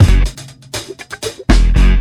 Loop

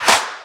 Shifted Clap; FLPRO

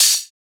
Open Hat; FLPRO

